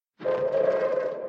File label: Nuke; Fallout 76